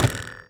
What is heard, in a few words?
wire warble taut